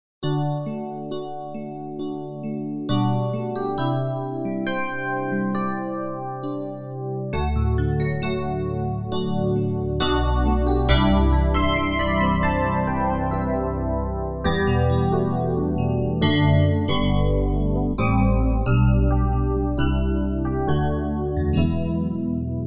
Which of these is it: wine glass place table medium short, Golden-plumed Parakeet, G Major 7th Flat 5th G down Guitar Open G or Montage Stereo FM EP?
Montage Stereo FM EP